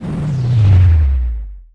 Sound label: portal; soundspvz